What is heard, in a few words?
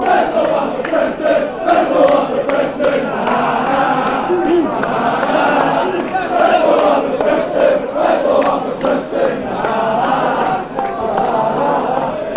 lets all laugh preston